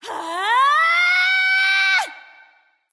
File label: battle cry; smite; amaterasu